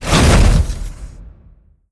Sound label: move approach walk, dog; npc; scripted